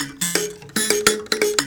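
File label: PLK Bass Mbira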